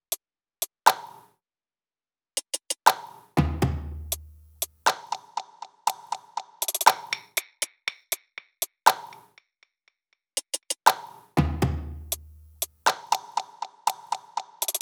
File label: top loop, Drums, Loops, Top Loops, Pop; queensberry 60; Slate; SD PASADENA Pop Sample Pack